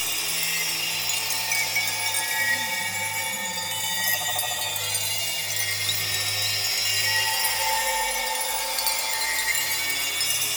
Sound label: Floating Circles Loop; Ambience; Gameplay; Warframe; Entrati